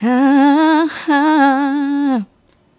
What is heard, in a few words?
Female Vocal